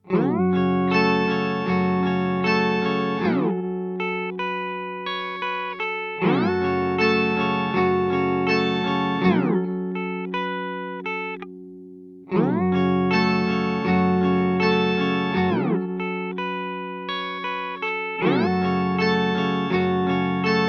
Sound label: EHX Pico Pitch Fork Octave down Slow Attack